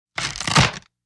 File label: Wood Splinter Wood Br